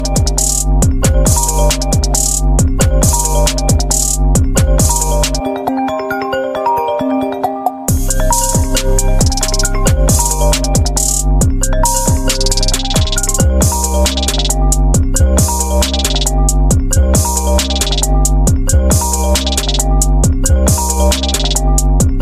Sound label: apple iphone original ringtone